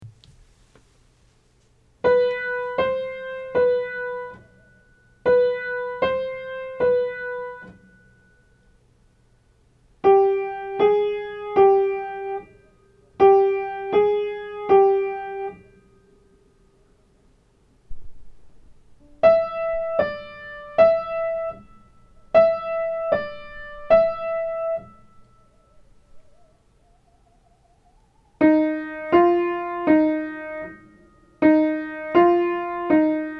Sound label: Pitch clefs intervals Aural Training exercise